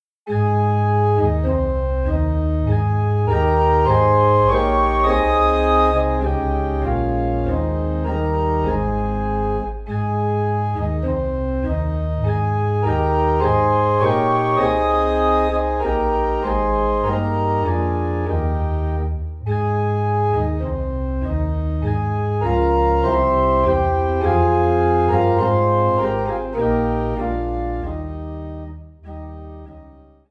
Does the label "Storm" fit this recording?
No